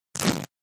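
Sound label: Cloth Split Rip Tear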